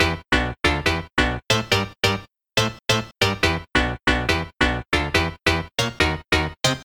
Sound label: Boss Chords